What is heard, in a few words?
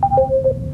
Door Chime; trek